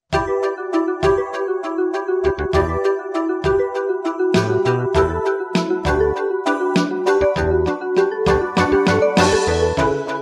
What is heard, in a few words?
pan jam